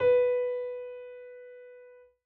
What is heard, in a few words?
piano b; Golden Crayon Sounds